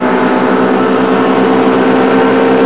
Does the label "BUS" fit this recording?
Yes